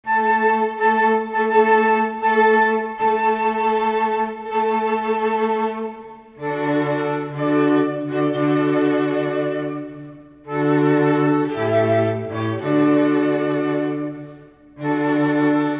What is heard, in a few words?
Bridal Chorus, Flute Quartet